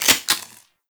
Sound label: Mech; Weapons; Warframe; TheNewWar; Omicrus